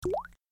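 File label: simple ripple down, samsung touchwiz sounds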